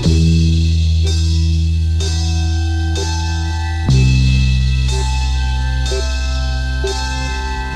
bass, Loops

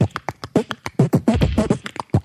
Beatbox; Drum loops

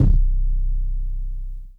thick, kick; Heartbeats

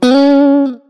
pluckerz, Guitar; Notes

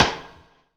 Rimshot, Snares

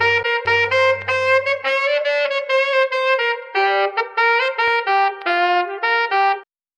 sax; Phonky

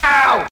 ow

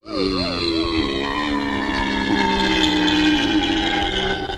burp long

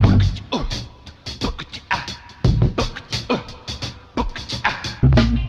bass note added, Drum loops

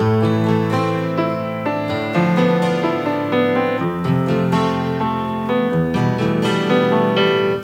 piano, Sad

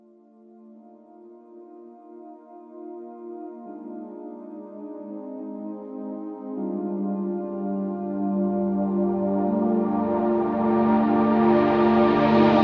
keys

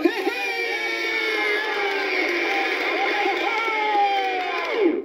crowd cheers; ooh ahh; human

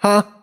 huh, Voice

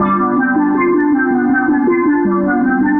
keys jamacian, loops, phonky

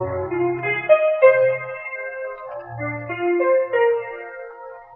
piano, Loops, Sad

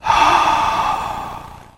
"ah" exhale

